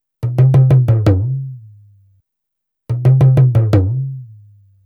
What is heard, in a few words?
ETHNO EXTRA 90; ethno2